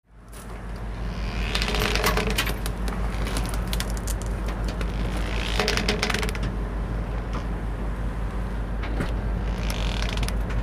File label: Dock Amb Rope Creaks C